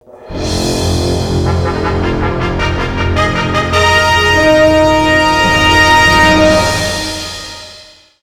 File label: Jingle Fanfare; Amazing Island